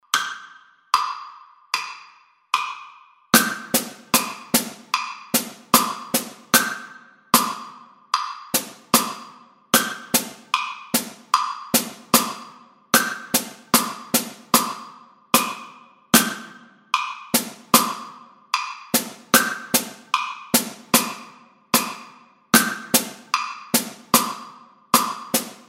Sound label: drum set